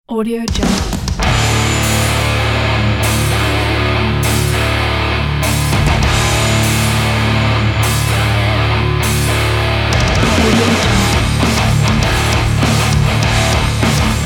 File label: metal; mbrecords